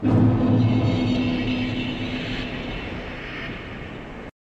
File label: new area; dark souls